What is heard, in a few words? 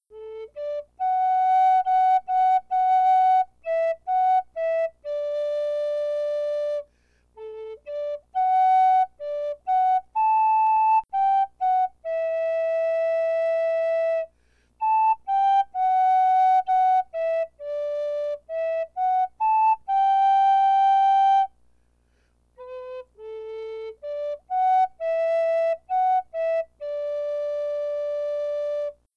Red River Valley (Flute Solo)